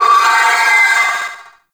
Attack Long, Vocals

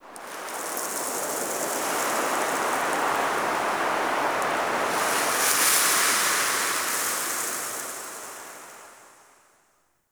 Sandy Wind A; Ambience; Warframe; Man In The Wall

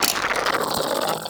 Pistol Reload; Warframe; Sapient

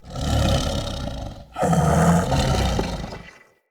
Corrupted Battle Dog Snore; Animals; Vocals

